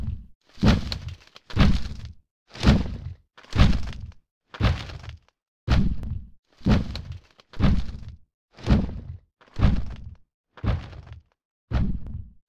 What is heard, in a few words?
Walk Away; Urwin